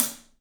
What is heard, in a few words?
Paiste hat, drums; 3D Sound